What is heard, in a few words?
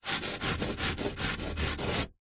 polystyrene thin sheet scrape edge on concrete several times